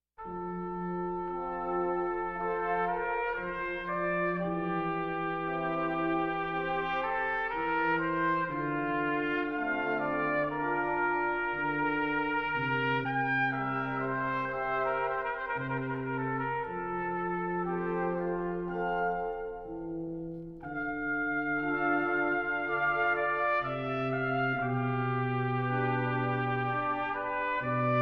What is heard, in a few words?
Airoso For Brass